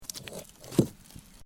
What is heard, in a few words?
Bricks Movement Minor; Material components for constructing more complex sounds